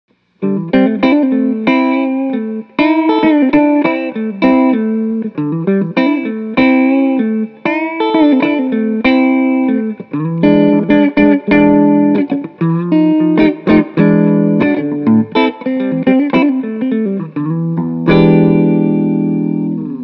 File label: Clean